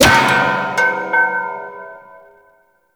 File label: Lift Bell; Warframe; Duviri